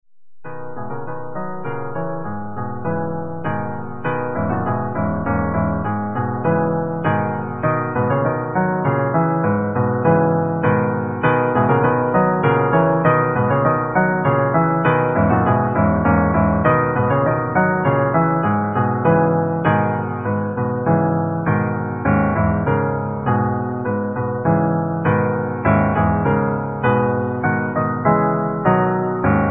instrumental

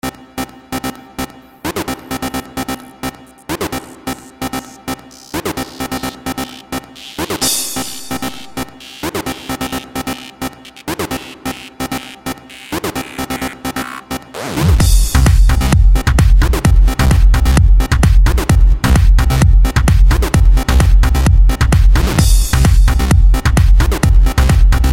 pad philter

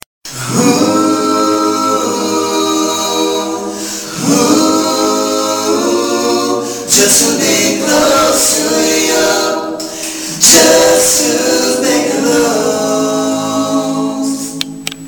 a capella

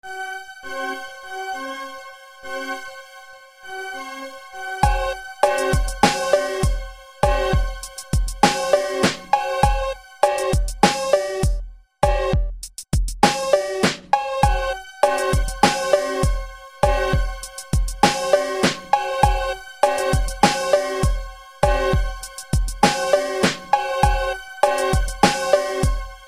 instrumental rap

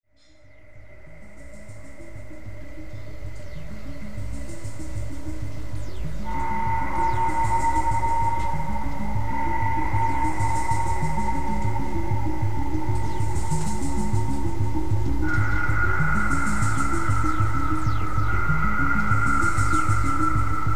noise, nuclear bomb; wc noise experience

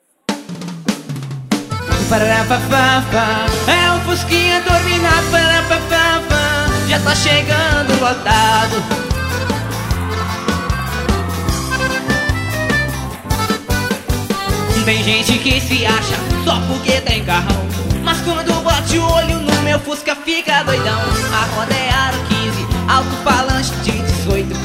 bass

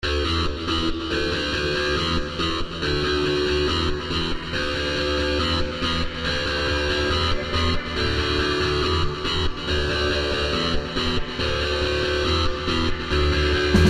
metal

